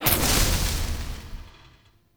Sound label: Encounter Pillar Deactivate; Encounters; Ode Pillar